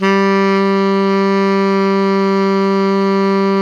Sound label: TENOR PP G 3, Saxophones; Greytsounds; Philip Wolfe David Crozier's Saxophones